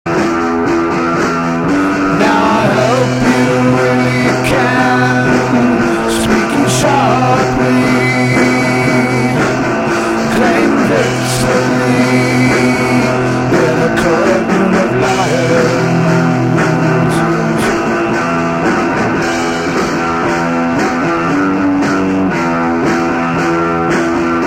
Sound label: guitar